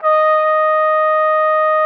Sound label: D#5, TRUMPET; Xsample VOL-3